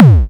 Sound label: BASSDRUMS; DRUM SOUNDS